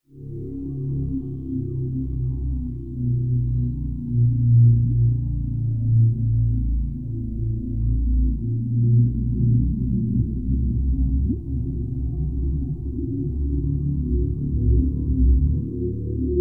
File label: Spooky Futuristic Black Hole Ambience